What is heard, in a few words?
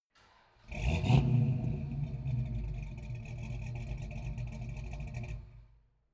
car camaro start rev once exhaust